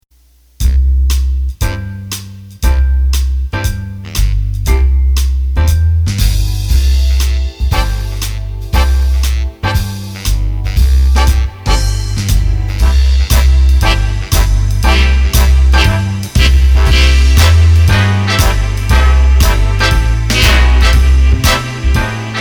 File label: Foxtrot, accordion